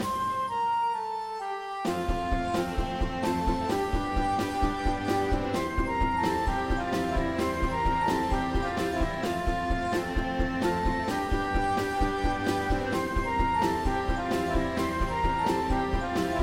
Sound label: Battle loop; Koa and the Five Pirates of Mara; Paco Mitos